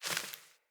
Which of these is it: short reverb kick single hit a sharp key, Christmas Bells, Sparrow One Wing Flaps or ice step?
Sparrow One Wing Flaps